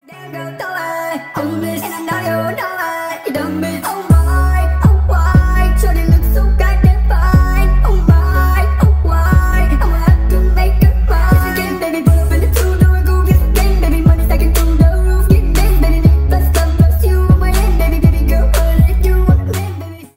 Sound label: speed up reverb